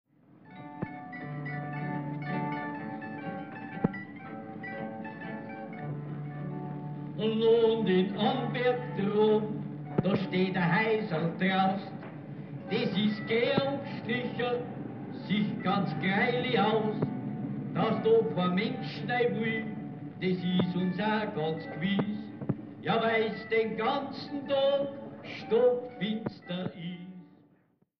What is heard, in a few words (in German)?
Zuchthauslied; Kiem Pauli